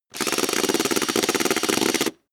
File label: drill wire brush rough; maschinen; bohrer; soundpool